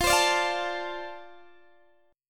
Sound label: F 6th F down Guitar DADGAD; F6 strummed